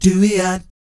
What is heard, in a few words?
DOOEEOT, Vocal Jazz Fx